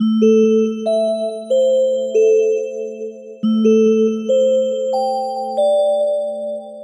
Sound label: 140 Am, Melodic Loops; M8; Electronisounds